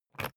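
briefcase plastic latch lock key pull out quick, Büroarbeit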